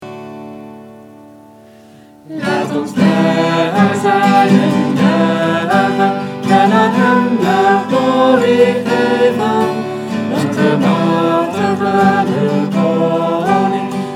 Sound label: INSTR